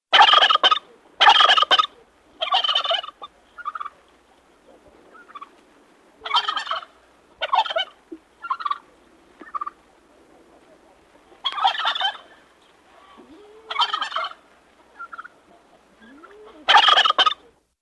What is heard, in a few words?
SFX TURKEY MULTIPLE